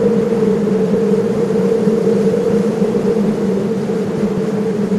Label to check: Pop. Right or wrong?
Wrong